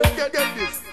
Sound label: Reggae Notes